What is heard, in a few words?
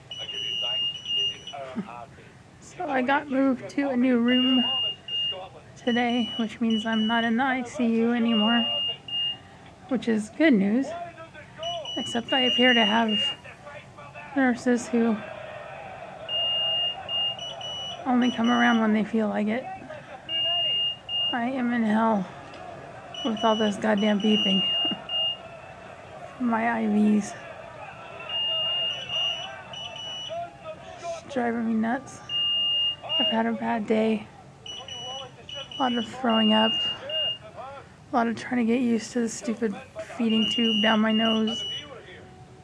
beeping